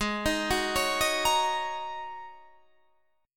Gsharp 9th Suspended 4th Gsharp Guitar Standard; arpeggiated; capo 9; chordshape; 16,18,16,18,16,18

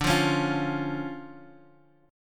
D Minor Major 7th D down Guitar Half Step Down; DmM7

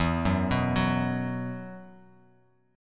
E Suspended 2nd, Esus2 chord; arpeggiated; 12,9,9,11

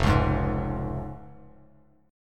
F Minor Sharp 5th, Bass Standard, chord; 1,4,6,1; Fm#5 strummed; JGuitar

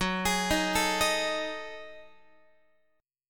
Gb Minor 6th Add 9th Gb down Guitar; arpeggiated; JGuitar

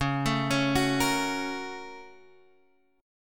A sharp Minor Major 7th Sharp 5th

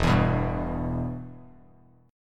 E-Minor, Bass Standard; strummed; JGuitar

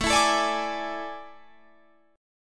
A Major 7th Suspended 4th, Guitar, Drop D

